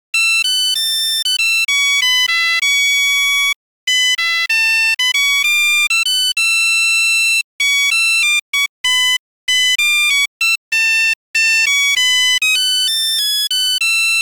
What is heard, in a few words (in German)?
Klingelton; tatort; kommissar thiel; iphone